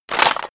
stone gravel clatter

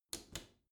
bathroom cabinet close soft slow; objekte; tueren

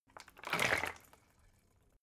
bottle water big upend; berufe

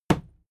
fight impact sweetener cloth; nahkampf; soundpool

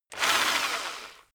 rock drill rev high short; bohrer; soundpool

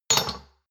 shot glasses stack medium; berufe; gastronomie; soundpool

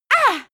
female attack

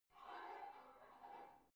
barbell heavy plate pull out slow reverby; sport freizeit; fitnessstudio

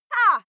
female shout hah; schreie; menschen

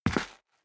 step boots concrete grit single left; schritte; Footsteps

